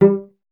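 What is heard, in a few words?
strings violoncello G; Miroslav Vitous; Solo Instruments VOL-1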